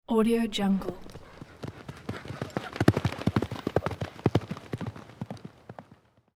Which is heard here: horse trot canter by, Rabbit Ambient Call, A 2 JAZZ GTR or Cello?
horse trot canter by